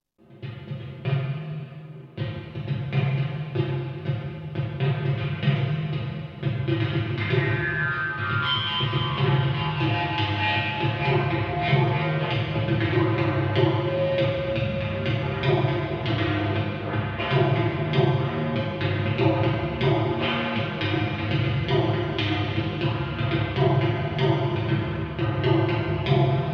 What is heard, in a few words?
speak & drums - train